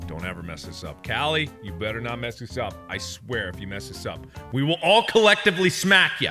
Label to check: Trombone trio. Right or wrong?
Wrong